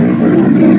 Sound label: alert, ogre; svencoop; legends